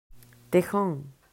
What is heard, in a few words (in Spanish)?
tejon